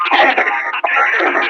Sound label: Enemy Pain; Warframe; Lasrian; Battle Barks